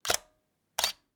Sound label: camera shutter